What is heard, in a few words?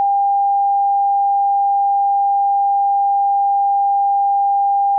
800 Hz Sync Tone